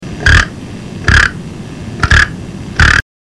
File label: fun frolic, The Fun & Frolic Call, crow; Crow Busters